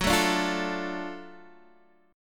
Gb Minor Major 7th Sharp 5th Gb, down, Guitar, Open D